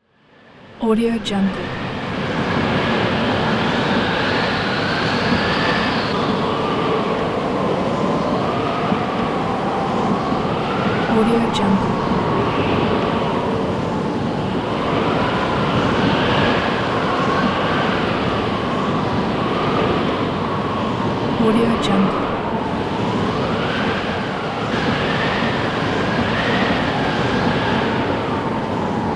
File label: blizzard